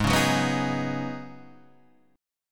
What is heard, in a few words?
C sharp 9th Suspended 4th G sharp, chord